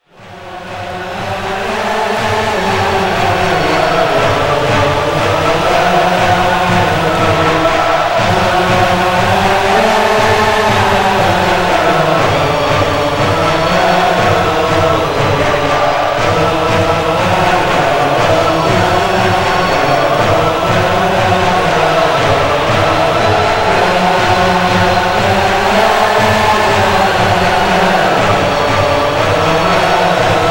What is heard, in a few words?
Chants, Ode To Joy